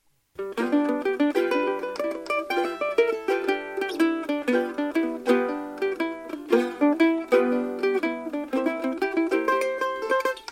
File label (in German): blues in g